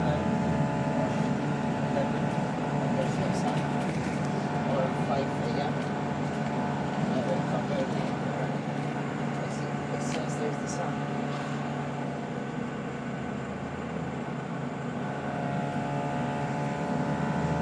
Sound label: bus noise